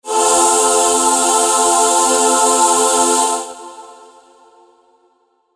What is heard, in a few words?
jingle chorus